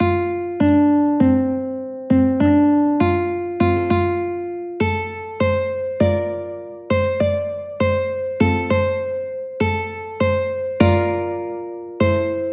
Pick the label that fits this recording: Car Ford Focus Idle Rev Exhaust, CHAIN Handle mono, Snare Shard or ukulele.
ukulele